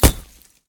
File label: basic hit; Smite; Zhong Kui; commando